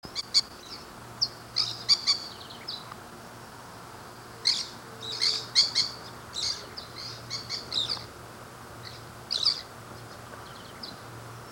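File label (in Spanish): chiripeverde, Chiripepé Cabeza Verde, Pyrrhura frontalis, Reddish-bellied Parakeet; cantos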